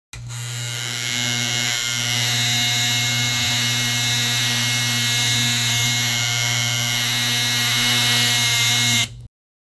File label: the sound of the shaver, grooming